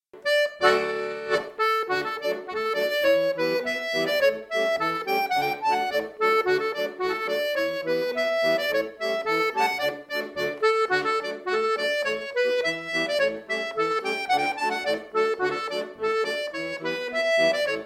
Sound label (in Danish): polka